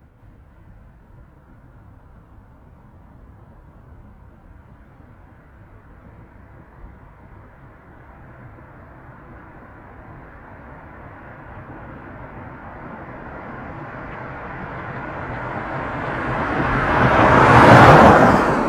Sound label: Generic Car, Pass By, Fast, Desert, Ext